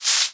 Legs Shuffle; Willard; TR3; Playable Tutorial Level

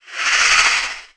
Lizard Man Fire; Playable Tutorial Level; TR3

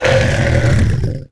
Werewolf Death; Playable Tutorial Level

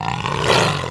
Tiger Roar; Playable Tutorial Level; TR3

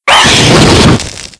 Hydra Fire; Playable Tutorial Level

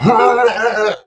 Boss Death; Puna; Playable Tutorial Level; TR3

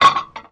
Door Clank; Sett door; Playable Tutorial Level

